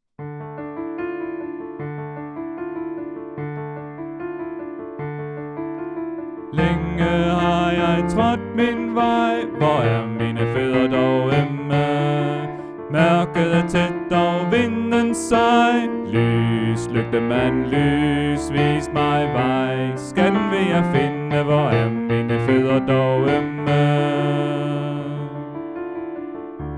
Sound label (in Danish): Bas